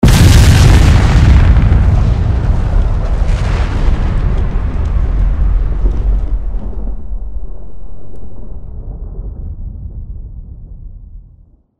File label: fat explosion, nuclear